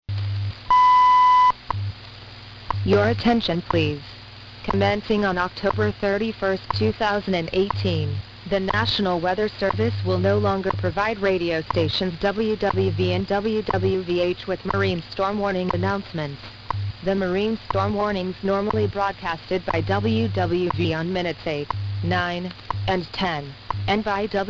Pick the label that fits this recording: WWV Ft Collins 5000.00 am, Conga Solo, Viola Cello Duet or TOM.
WWV Ft Collins 5000.00 am